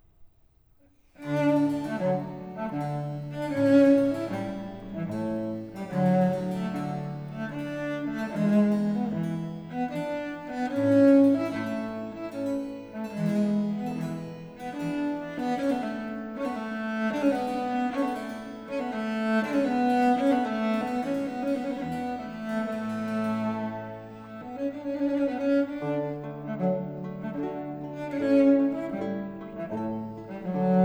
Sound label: Concerto D-dur - II. Andante E Spiritoso, violoncello; RV 403; Antonio Vivaldi (1678 - 1741)